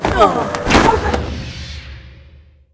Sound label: Harpy Thunder Die